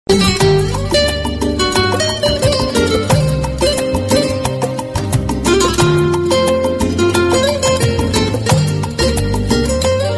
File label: spanish guitar